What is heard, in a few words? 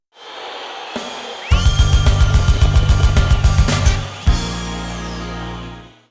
Race Start (Machine Test); Gran Turismo Japanese Edition; PS1